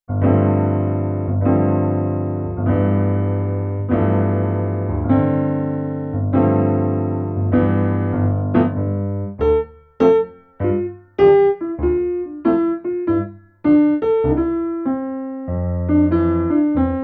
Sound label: Coffee Table Jazz, Restaurant Background Music